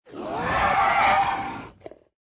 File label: ANIMAL CREATURE